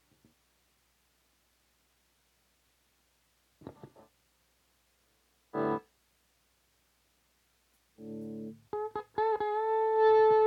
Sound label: Strings